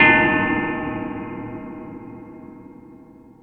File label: Jungel Percussion, E-MU Pro-Cussion